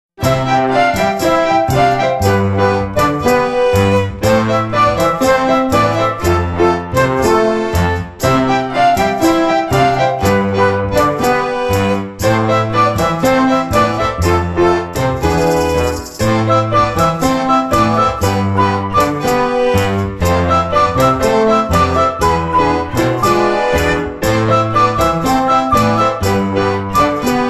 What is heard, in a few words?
Ring Passion Tango; Microsoft; Windows Mobile ringtones; Matthew Bennett